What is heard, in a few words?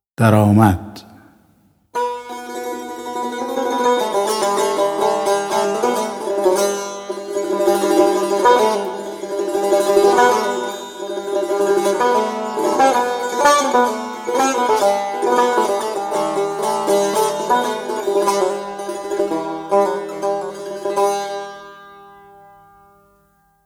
segah daramad